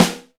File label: Snare, Alesis DM5 Drum Machines